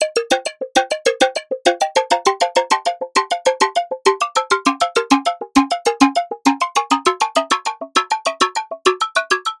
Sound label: Phase Plant